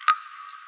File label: drip, ambient weather